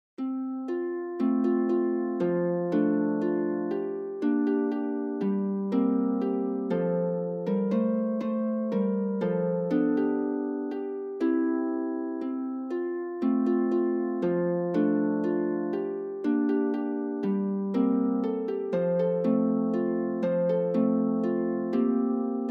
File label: molly malone harp